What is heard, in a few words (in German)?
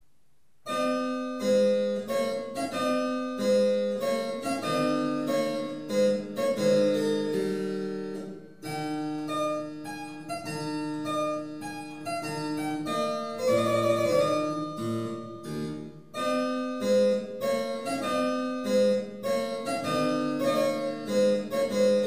Cembalo; LSKB KV Anh 109b; mozart